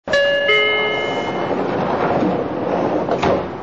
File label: door